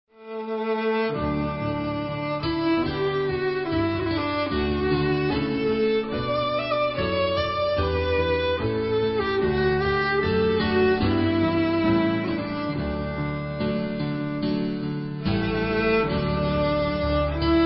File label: Instrumental; Minstrel Boy